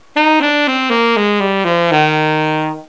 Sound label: normal, saxophone; Lesson 1; ●ドレミファソラシド; Embouchure; でドレミファソラシドを吹いてみよう